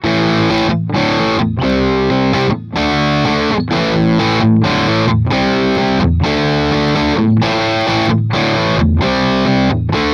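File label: overdrive bridge, guitar; Guild Surfliner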